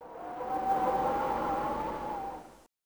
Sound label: Wind; Gun Frontier; arcade